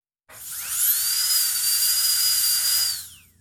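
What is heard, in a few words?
dental drill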